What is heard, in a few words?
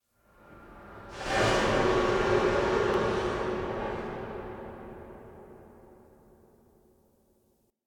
Steam Blast D; Warframe; Ambience; Spawn Rooms